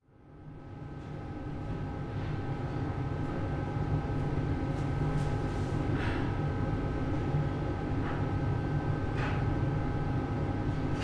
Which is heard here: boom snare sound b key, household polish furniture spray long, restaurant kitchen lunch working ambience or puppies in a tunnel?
restaurant kitchen lunch working ambience